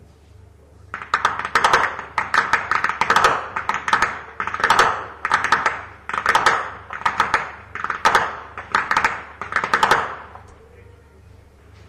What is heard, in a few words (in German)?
schnalzen; kemating; saaldorf